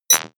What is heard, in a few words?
sci-fi click negative light; maschinen; signale; soundpool